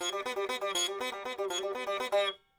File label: sitar